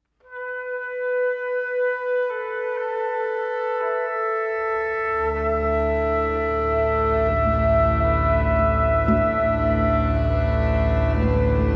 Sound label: Freeform Winds String Drones